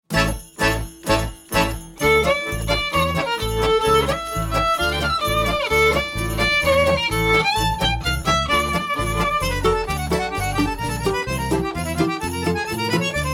Turns, exam music

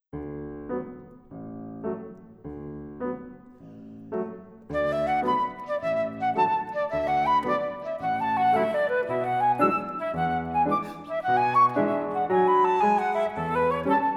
Battements Tendus And Battements Glis, exam music

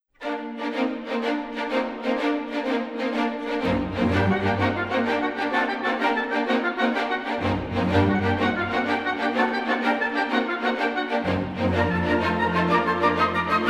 Grand Allegro (Continuous), exam music

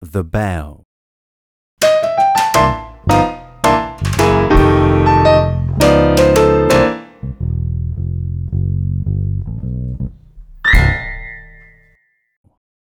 The Bow, exam music

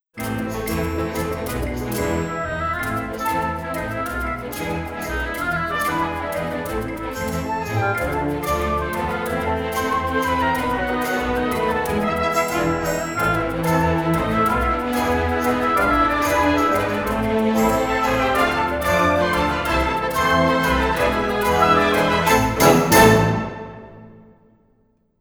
Turns (Solo)

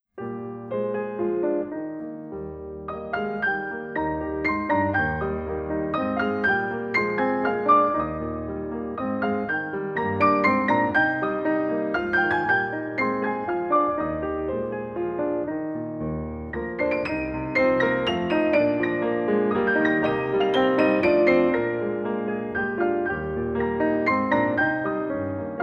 Fondus, exam music

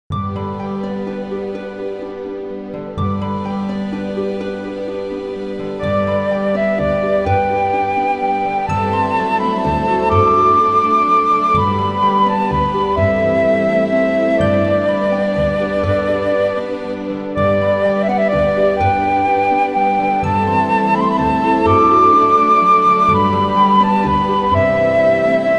Bend And Run, exam music

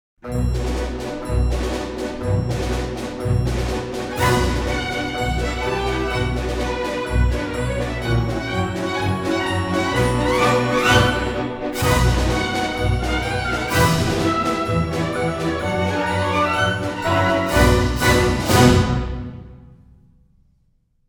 Assemblés, exam music

